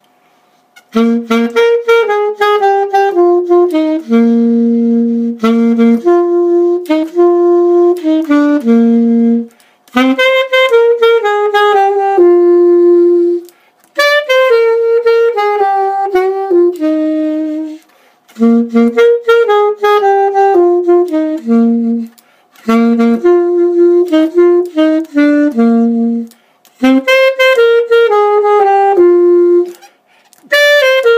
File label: saxophone